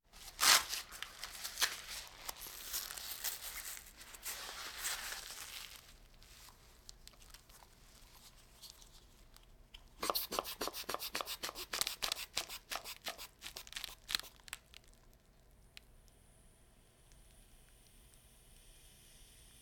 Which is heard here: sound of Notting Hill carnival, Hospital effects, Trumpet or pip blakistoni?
Hospital effects